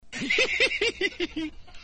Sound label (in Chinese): 笑; solvable-sheep-game